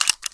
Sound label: clip out; silverballer